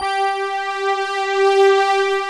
FM SYNTH; OMI - Universe of Sounds

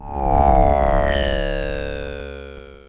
FX, Dual Percussion Synthesiser; Klone Dual-Percussion-Synthesiser

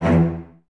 Cellos E 2; Miroslav Vitous; String Ensembles; LINKS ORCH

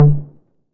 808 MT Tape Sat, Tom; Goldbaby Tape808 & Tape909

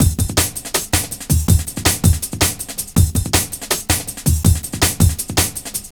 Combination, Drum Loops, Jungle, 162; Jungle Warfare 1&2

